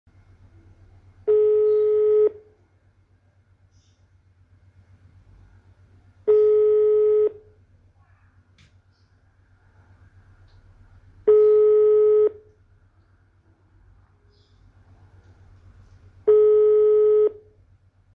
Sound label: call calling, phone